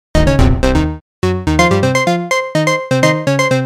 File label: catchy tone